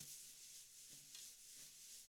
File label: TAM Double Platinum Drums; ILIO; Double Platinum Drums 2; TAMBKIT